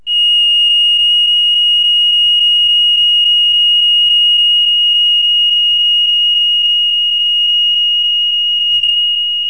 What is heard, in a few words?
bell